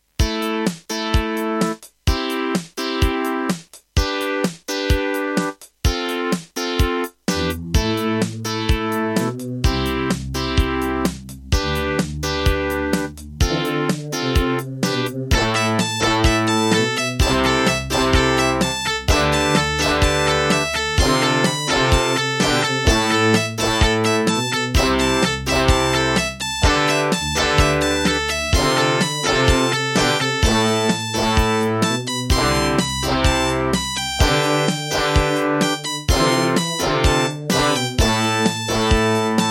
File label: Doepfer GMX-1; raptor call of the shadows